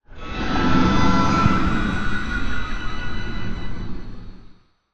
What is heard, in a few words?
ambient; toxifin